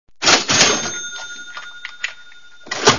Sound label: POINT DOUBLER